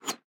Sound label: elec wand funnel end